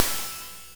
hh shake; opl3; M8

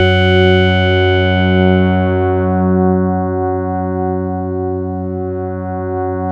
SYN PPG, Microwave; Sound & Vision - Gigapack I CD 2

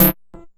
grind, EMU XL-7

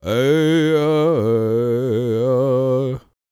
World Voices, CHOCTAW

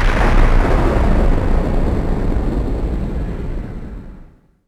THUNDER; Best Service - XXL Production Effects

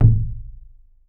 SAMM CHU, KOREAN DRUMS; AKAI S6000 CD-ROM; WorldSounds

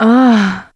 SEX; Zero G; Man Machine

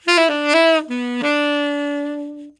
MELLOW, TENOR SAX

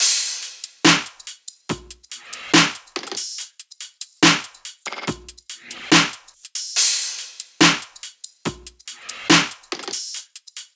loop top 142, Seismic Loops

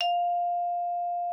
XYLS F5, VIBES; Xsample VOL-4

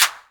clap, Korg Electribe ER-1

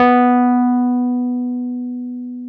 PNO Wurly

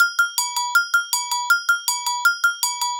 Agogo Bells; Sonic Foundry (Sony Creative Software); Joe Vitale Latin Percussion

